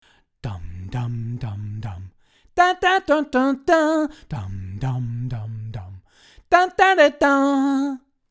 WA73 tone

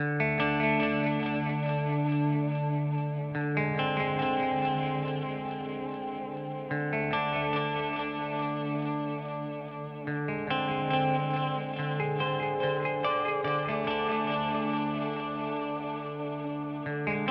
Boss GT-1000 Core Delay Bin Drum Echo; Vergleichstest

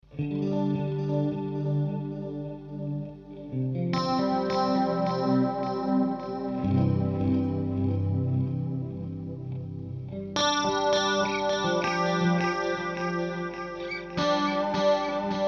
chorus

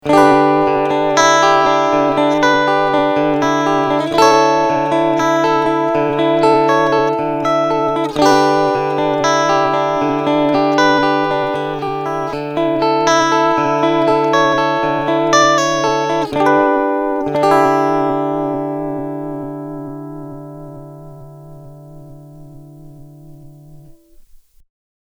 Vibrato

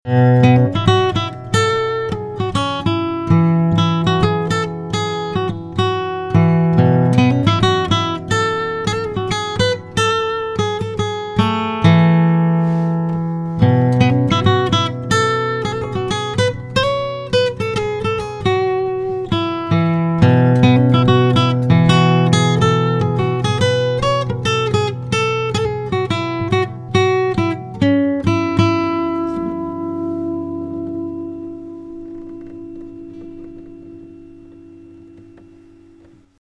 Nice Melody

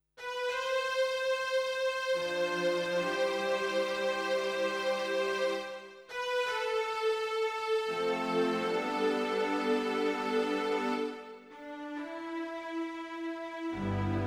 Strings

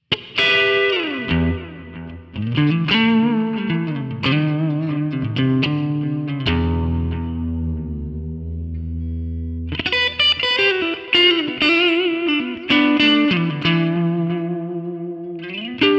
Breakup; Gitarren-Plug-ins im Vergleich; Amplitube 5

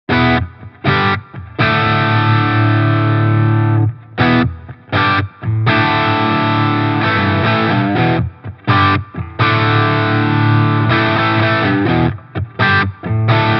MicroCAB 4 x 12 V30; Harley Benton MiniStomp

